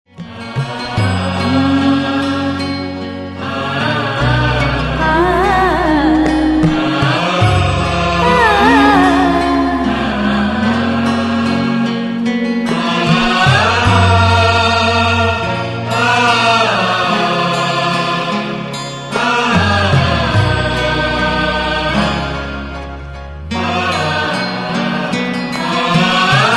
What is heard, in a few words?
BLISS MUSIC